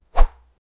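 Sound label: swing sound of medieval sw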